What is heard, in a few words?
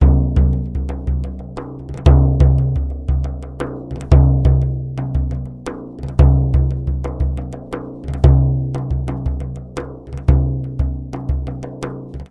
Drum Song